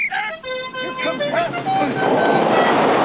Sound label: horn